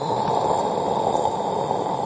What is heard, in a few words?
pushing; gemsters